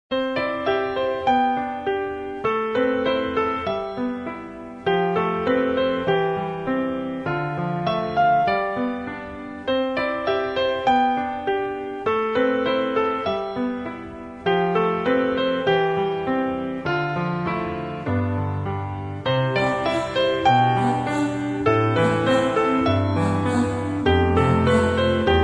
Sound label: bgmusic